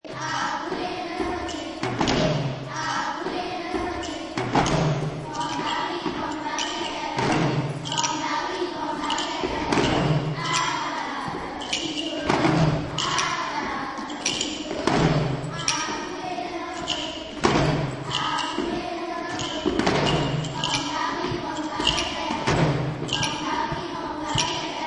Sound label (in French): Chant